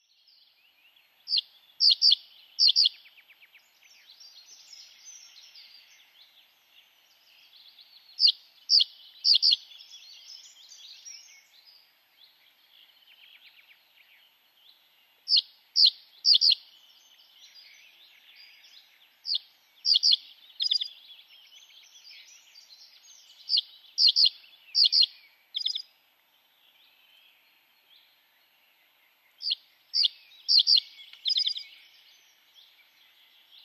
pajusirkku; Kutsuääni helposti tunnettava ”psiy”. Laulu lyhyt änkyttävä karkea säe; birdlife

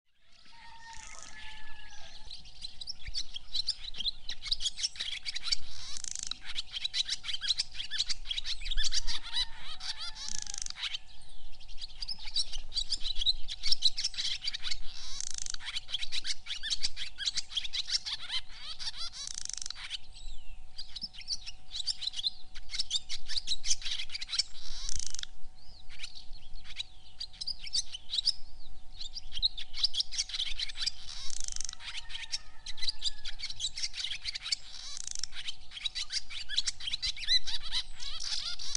haarapääsky; Hyväntuulinen, Rupatteleva ja nariseva visertely; birdlife

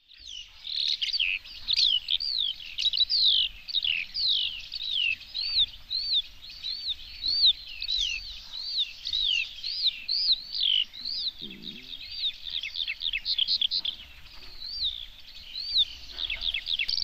kiuru; Laulu riemukas pitkä livertely, joka yleensä esitetään lennossa. Kutsuääni ”drliu”; birdlife

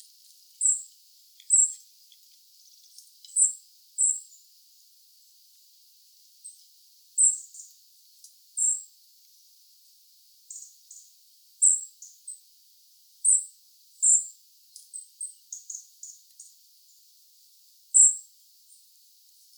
Tulipaahippiainen kutsuaani; tulipäähippiäisen kutsuääni; Italia 6.7.2015; Marco Dragonetti

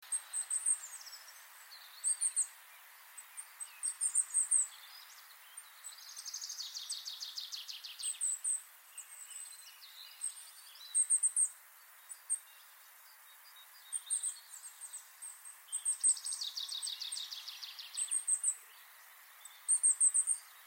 Hippiainen kutsuaani; hippiäisen kutsuääni; Kirkkonummi 18.4.2015; Eetu Paljakka

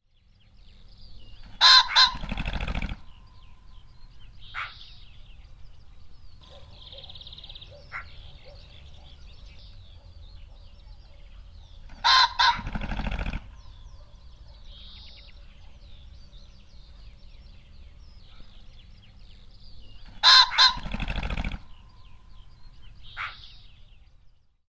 fasaani; Koiraalla kaksitavuinen käheä kiekaisu, jota seuraa siipien pärrytys; birdlife

